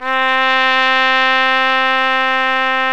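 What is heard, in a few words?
BRA TRUMPE, trumpet, brass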